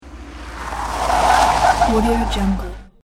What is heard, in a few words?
tires skidding screeching